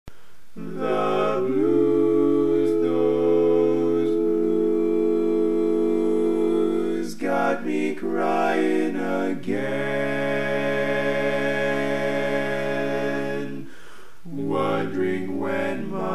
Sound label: bass